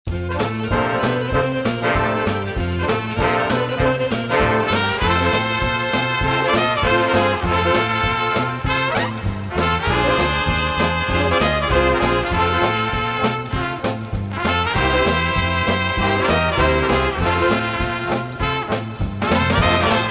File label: trumpet